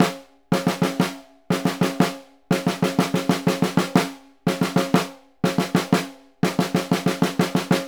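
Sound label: snare, Synclavier Percussion; ILIO; Synclavier Percussion 2; World & Orchestral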